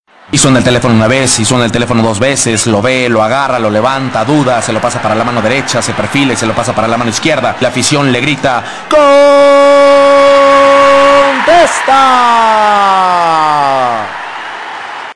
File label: Italian Tone